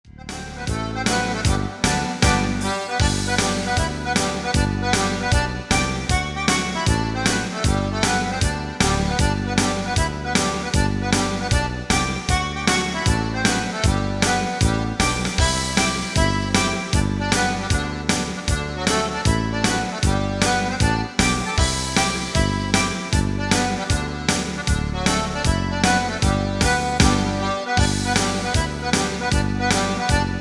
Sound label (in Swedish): kleine schottis